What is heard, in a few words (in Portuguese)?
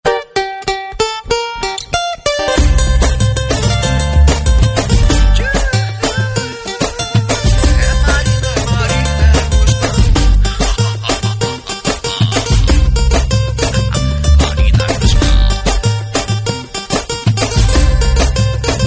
Jingle politico